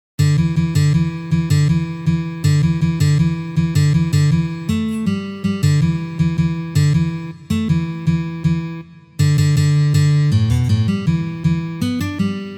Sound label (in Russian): na gitare